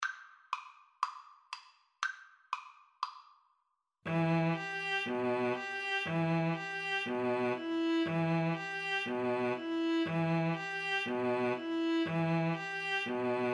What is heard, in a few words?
odessa bulgarish, String trio